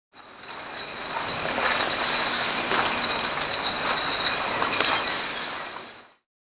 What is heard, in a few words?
recycle; buildings